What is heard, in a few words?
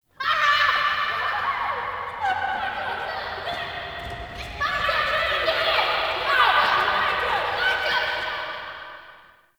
Children Amb; Ambience; Dungeon Island; Duviri